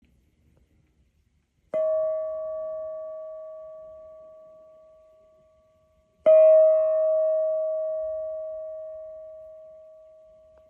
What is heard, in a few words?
Om Black Edge, serenity earth gong om with black edge 15cm